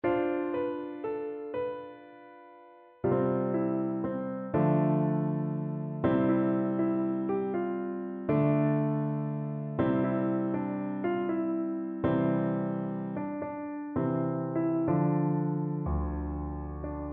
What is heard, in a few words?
PNO